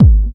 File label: Bassdrum; Best Service; House Definition VOL-1